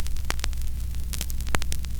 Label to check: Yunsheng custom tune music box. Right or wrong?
Wrong